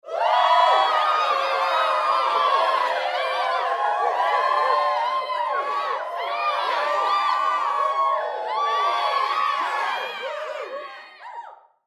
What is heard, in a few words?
Human Crowd Studio; Crowd Reactions Cheer Loud Long; Blastwave